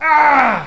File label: pain; kingpin